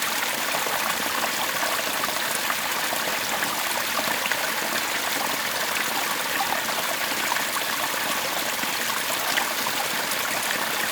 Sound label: water stream medium